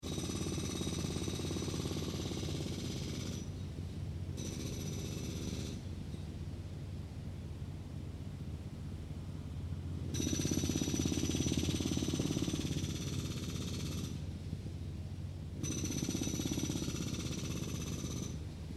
Construction Jackhammer, Medium jackhammer drill next to a closed-off street; Quiet enviroment; City Sounds; Construction Sound Effects